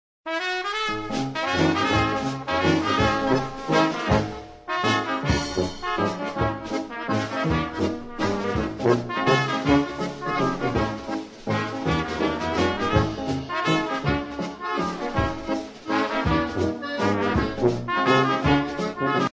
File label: laendler